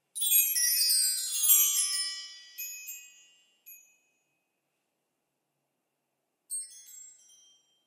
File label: Wind Chimes; بادزنگ